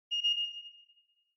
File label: Ticket Gate Beep Tone Far